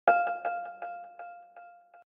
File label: Low Battery; Asus; Eee Pad Transformer (TF101)